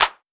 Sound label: clap one shot pop; Claps